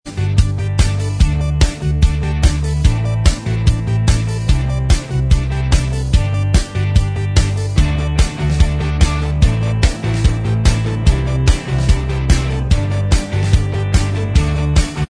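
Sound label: instrumental, backing tracks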